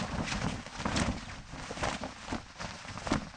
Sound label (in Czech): drap; Mafie 1